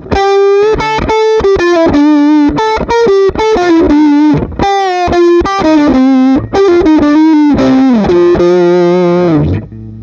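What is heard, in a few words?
90s Pedal off, guitar; Treble Booster; Guild 1984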